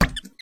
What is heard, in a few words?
Easter Egg Hit Ground; lethal company; ambience; windows